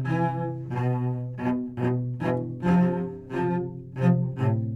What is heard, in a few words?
cellos